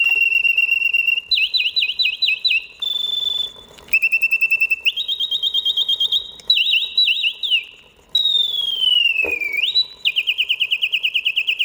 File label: enchanting birds